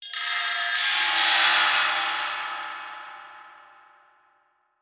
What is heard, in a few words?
Title Transition Metallic; Production Element